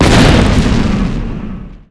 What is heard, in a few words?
death; Alien Arena; droideka